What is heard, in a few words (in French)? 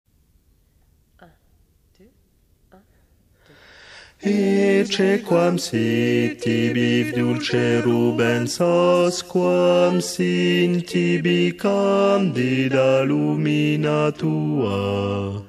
basse; ecce quam sit